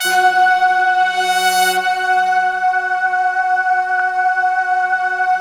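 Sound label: BRASS, Slow Brass Choir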